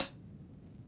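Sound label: Vinyl Closed Hat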